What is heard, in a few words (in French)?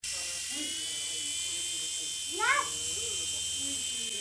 helicoptere